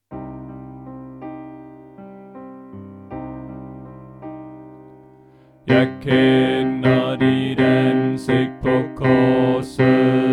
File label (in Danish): Bas; Påske; oevefiler